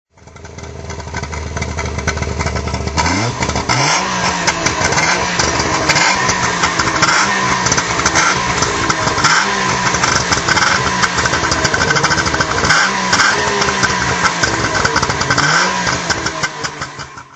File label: saab 96 2 stroke throttle